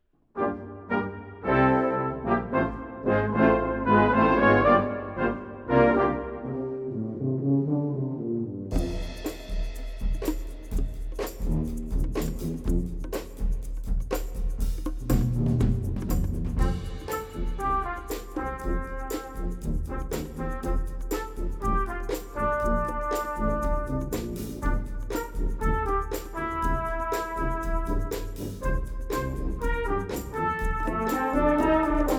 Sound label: jingle bells jazz